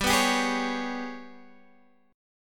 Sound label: Ab Minor Major 7th Double Flat 5th, G